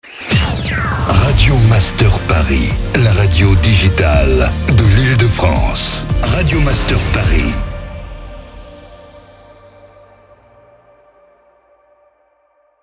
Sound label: Jingle SLOW; Paris; Radio Master PARIS